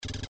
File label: robo